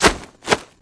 whistle grab

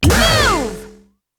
move; komaru naegi

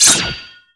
mask cut; phantom

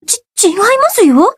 gasp

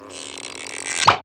stretch & pop

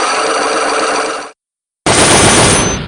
lock start; psychelock

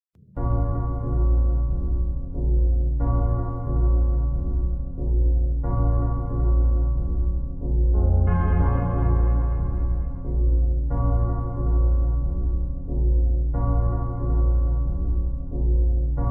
claw finger

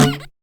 grab

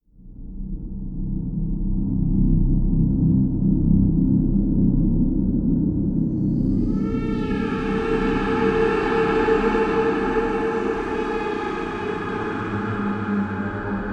melody of shivering

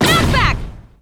knockback; komaru naegi

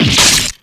damage crack; jinxie

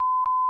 2 boop; matilda

